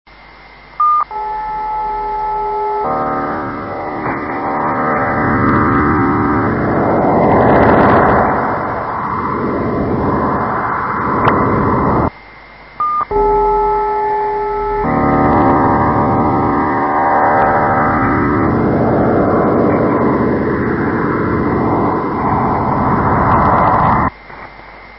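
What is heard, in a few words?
8781 kHz, amplified; haarp; ojacan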